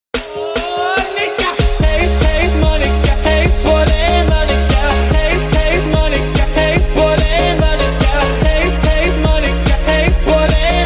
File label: Remix